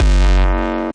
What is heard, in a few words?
Evil Bass Sounds, bass hit; multisampled; Desecrated Productions